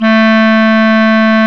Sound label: Clarinets; Roland LCDP04; 90 s Sample CDs; Clar Cls